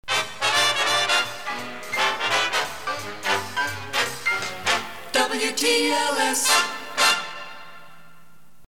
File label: Jingle